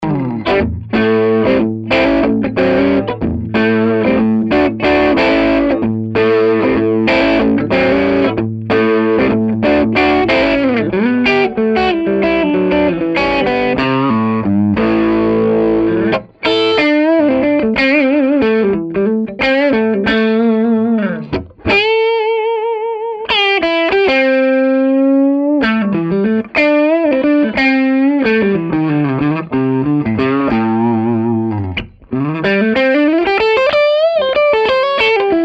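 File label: classic crunch bridge neck, humbucker; schwarzbach; HB59-Classic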